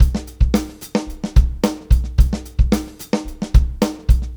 Live Beats, R&B Drums

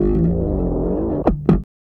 Bass FX

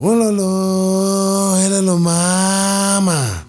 MALE SOLO, Heart of Africa

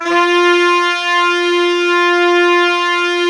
ALTO SAX F-L, Sax; Giga Samples Collection; SAX STAX

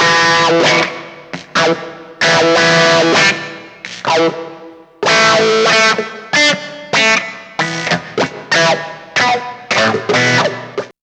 GTR; East West - Phat & Phunky CD2; 90's Sample CDs

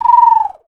bird calls, percussion

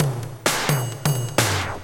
drum 130, techno trance drumloops

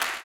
CLAP, blues & soul; ILIO; Vocal Planet

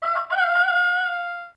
Rooster, Yamaha DD-35

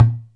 TABLA GE; ILIO; Synclavier Percussion 2; World & Orchestral

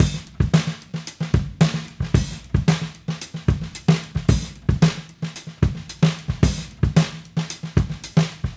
Raw Funk Drums, 70s Analog Funk; Sonic Foundry (Sony Creative Software); What it Is! 70s Analog Funk

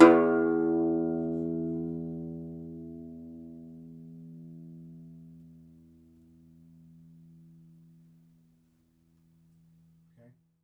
DOMRA, Plucked Strings; Segundo Domra; E-MU Producer Series Vol. 7 – Old World Instruments

